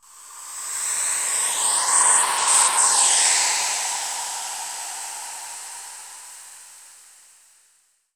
noise fx; Masterbits; Switch FX

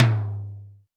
Tom Md Clear, Alesis D4

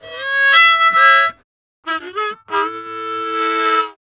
Harm 098bpm A, Harmonica

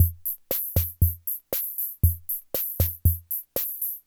Loop, classic machines, rhythm77

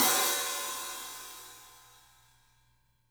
SABIAN HAT; 3D Sound; 3D Drums

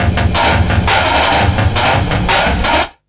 distorto, drum & bass beats & breaks; Fluid Dynamics

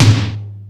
Lazer Drums, drum machine; E-MU Pro-Cussion-1

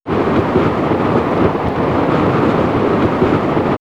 Nature Winter Wind; X-Static Goldmine

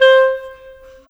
Clarinet, Cinematic

